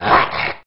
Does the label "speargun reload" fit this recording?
No